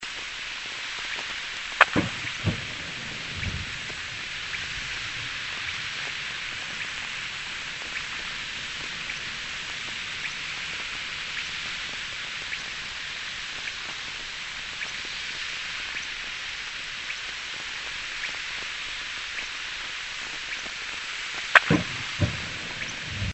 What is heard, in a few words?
Airgun